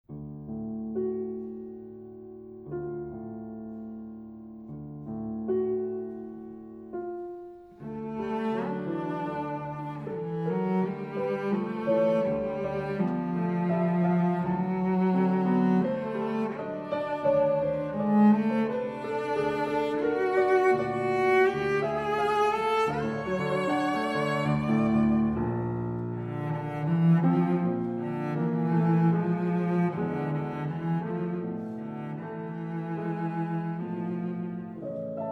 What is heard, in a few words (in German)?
Cellosonate, Sonate Violoncello & Klavier; Cellosonate 2. Satz; Mark Kosower, Jee-Woh Oh; Friedrich Hofmeister Verlag